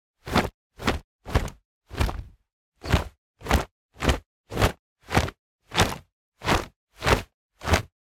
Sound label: Fist Swings Foley; noise creations